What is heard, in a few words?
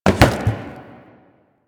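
Cardboard Box Impact; Warframe; Ambience; Nineteen Ninety Nine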